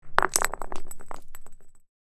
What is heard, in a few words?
Grenade roll on hard surface; gfxsounds